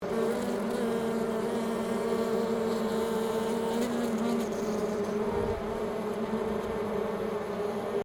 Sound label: Bee Hive